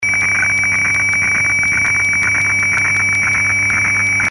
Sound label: fax